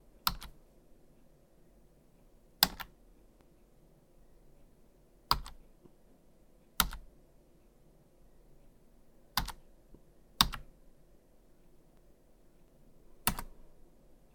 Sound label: type in